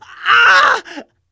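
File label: pain severe, Russian; Scout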